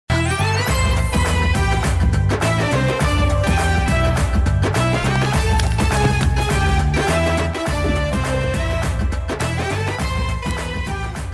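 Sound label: romantic background music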